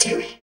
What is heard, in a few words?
SYN VOCOD, dance synth; Sound & Vision - Gigapack I CD 2 (Roland)